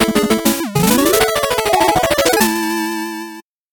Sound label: 8bit jingle fast